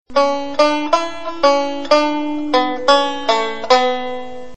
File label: a banjo